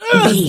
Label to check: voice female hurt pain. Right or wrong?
Right